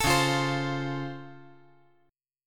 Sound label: Db Augmented Major 7th, down; strummed; JGuitar